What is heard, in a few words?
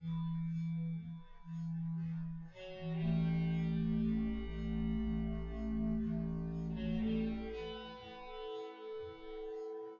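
with drums synth conv 1m sim; SASSEC07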